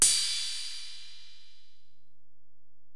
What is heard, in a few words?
Ride, Alesis DM-PRO, Drum Machines